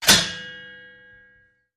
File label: Hit Sword Clink